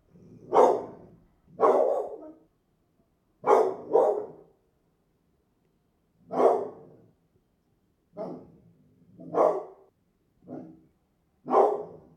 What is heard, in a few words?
dog - Pit bull; Tessa; NS Studios - ultra sound collection; animals